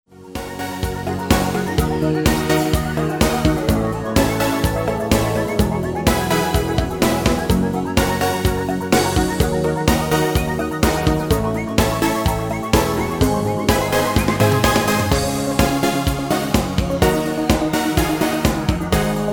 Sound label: Instrumental Sample; Schlager